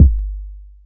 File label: big steel kick drum sample g key